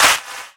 small reverb clap sound b key

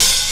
tamed open hat a key; open hi hat

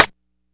airy steel snare drum d key

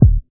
smooth kick sound e key; kick drum samples

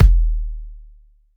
long kick drum sample d sharp key; kick drum samples

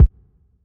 large room bass drum sound b key

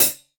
bright hat one shot a sharp key

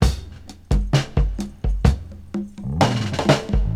128 bpm breakbeat c key

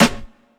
tight lows steel snare drum sound a key

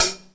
dry closed high hat sound f key

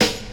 studio snare drum sample a sharp key; Mono

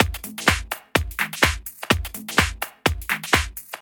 washed mid techno-house fill; drum loops

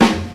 classic sharp snare a key

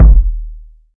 ambient bass drum single hit d sharp; kick

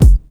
studio bass drum one shot F sharp key; kick drum; kick drum samples

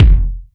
boom kick single hit f sharp key

